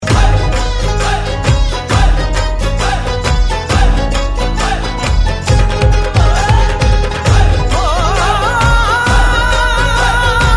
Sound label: Panjabi Music